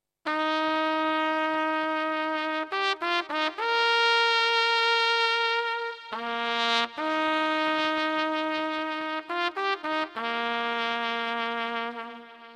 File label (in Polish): hejnal; leczna